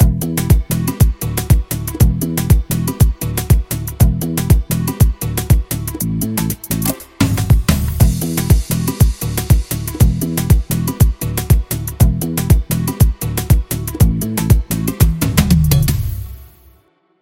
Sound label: loop 6-8, pop drum loop